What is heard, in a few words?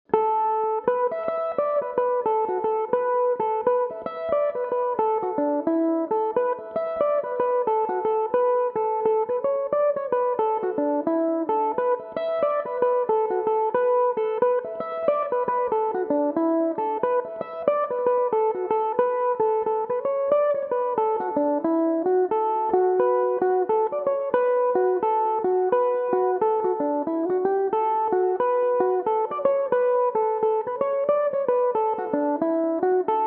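E dorian, Irish mandolin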